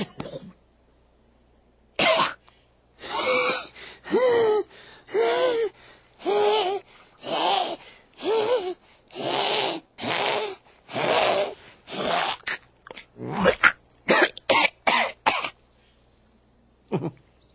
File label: pusspuke